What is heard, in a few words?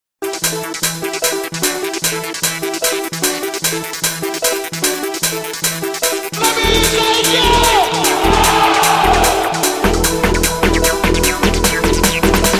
Electro Bomb; Tokyo Xtreme Racer 3